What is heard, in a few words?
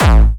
Jump kick, Jump hardstyle kick